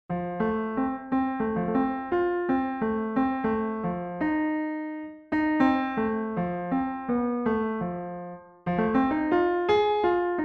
harmonics

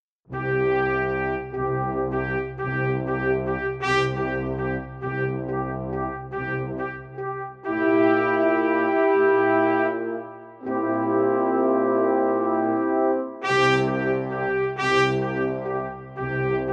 24 limit rainbow brass

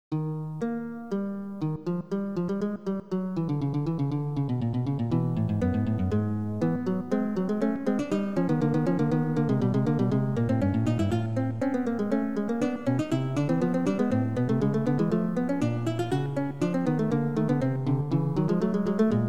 34edo Lute; Padanian Canon 2nd version

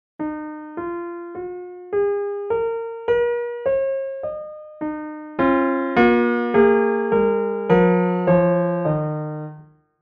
17 prog piano e, 17-ET

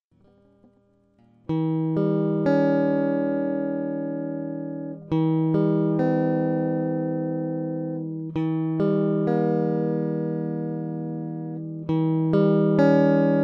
22deo chords, 22guitar

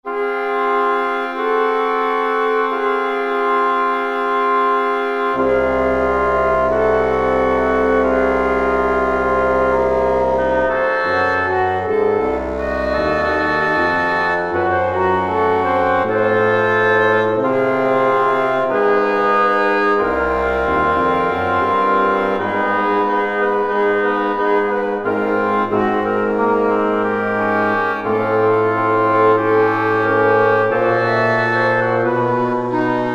pump woodwinds; pump tunings

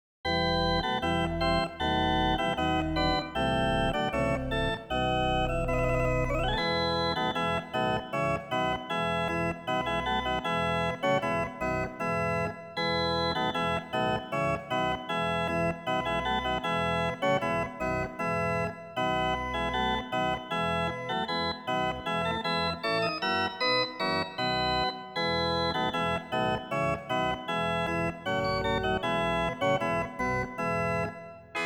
deck the Halls Well temp 46 edo 39 edo

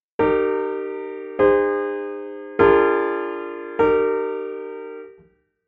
17 cadences, 17-ET

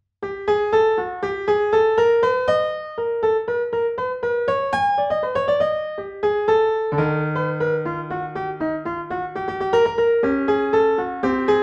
fantasy for piano in 25 edo

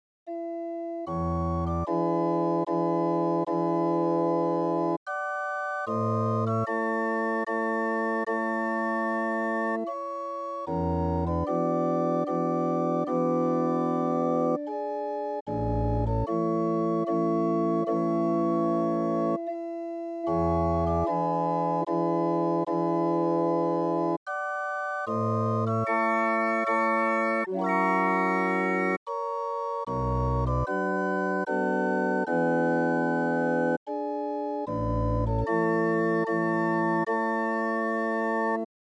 Organ Pipe Gedackt 8 4 Bassoon

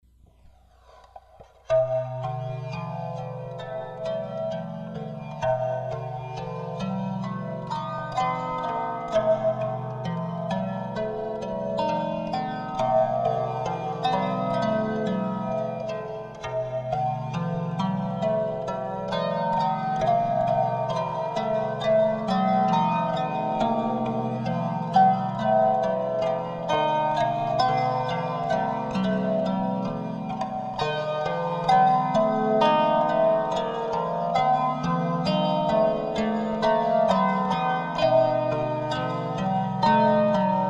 harp psaltry

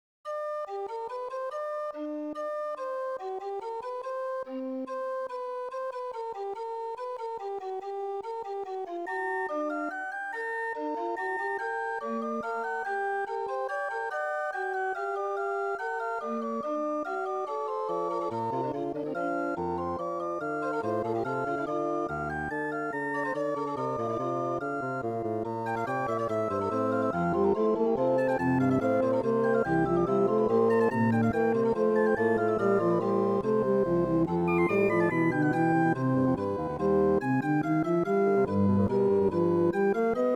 Menuet Organ Pipe 15edo

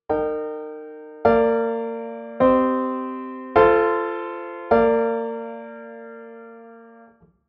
17 prog piano d, 17-ET

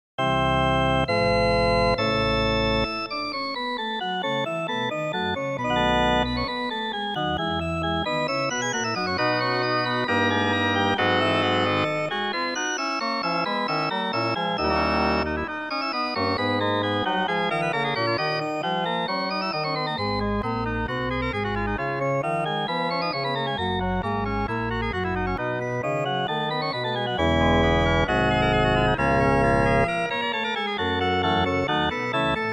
Prelude

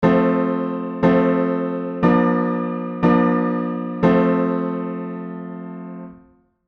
plagal cadence IV 16; 16 edo theory; 16-ET

